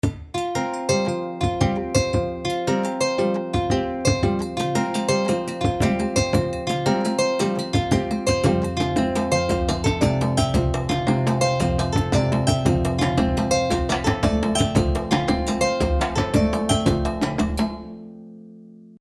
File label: West Africa Example